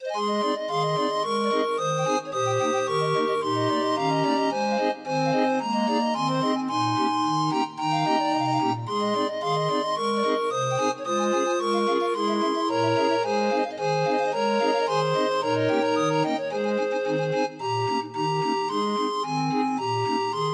Ode to Joy Happy Version 31 note, Grind Organ; Demo of 31 note MIDI file

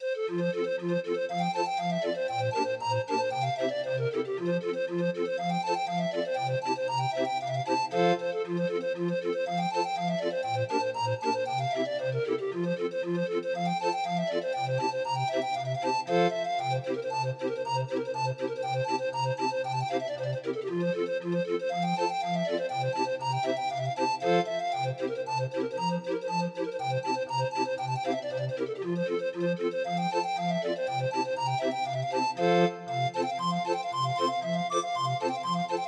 New Folk Dance Medley, 31 note, Grind Organ; Arrangement by Stephen Simpson Includes: – Harvest Home – Timor The Tartar – The Bristol

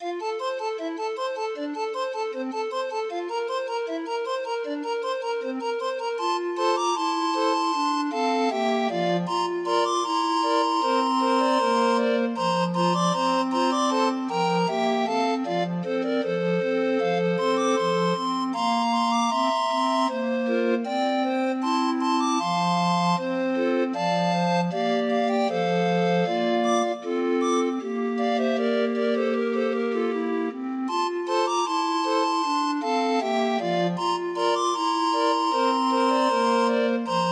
grind organ

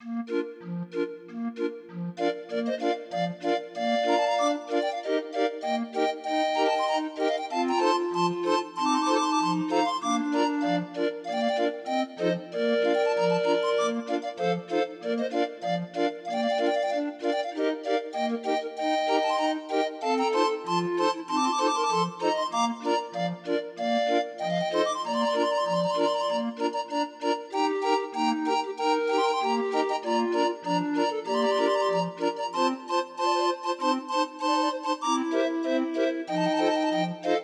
Grind Organ

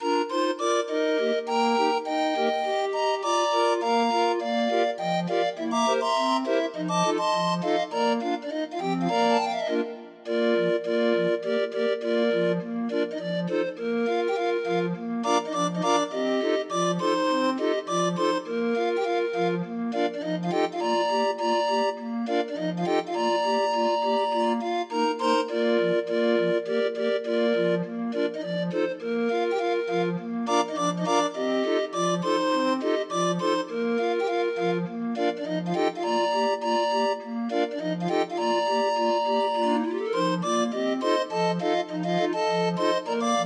Grind Organ

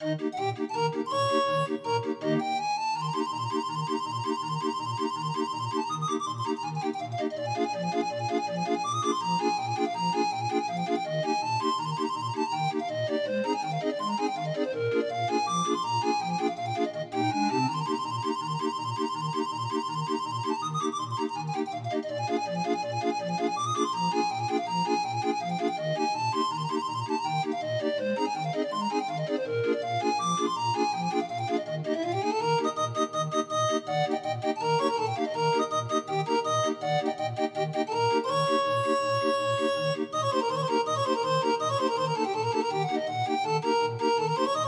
Hora Staccato 31 note, Grind Organ

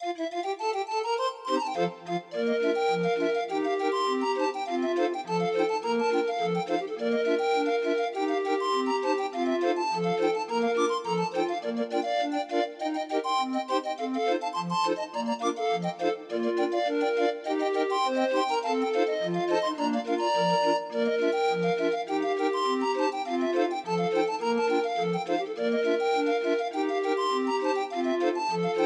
Pipe Organ Rag, Grind Organ

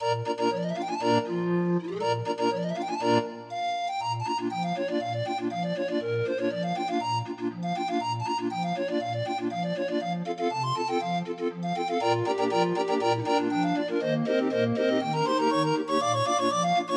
31 note, Grind Organ; Demo of 31 note MIDI file

